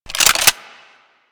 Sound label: Gunblade Reload, Weapons